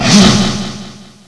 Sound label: CHEW